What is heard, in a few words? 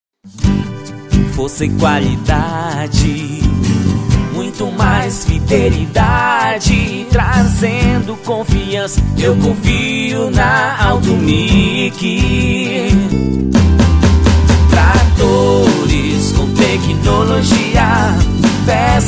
jingle